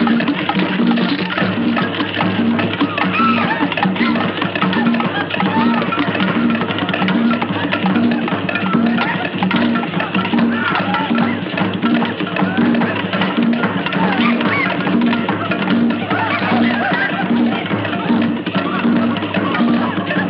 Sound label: Attack Music Loop